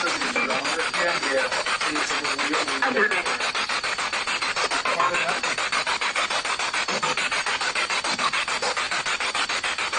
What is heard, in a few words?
Living Room Spirit Box